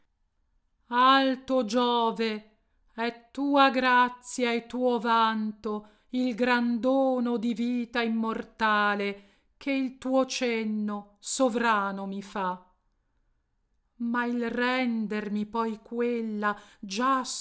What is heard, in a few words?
Alto; Giove Porpora STEREO; airs d'opéra du livre L'Italien chanté